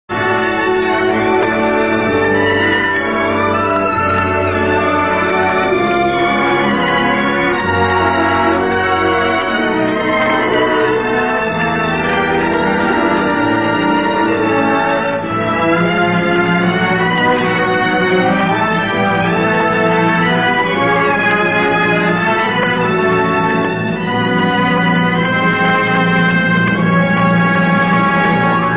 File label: black cat love theme